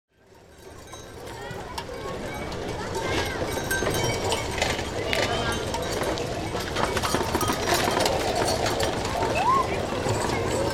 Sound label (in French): parc tete d or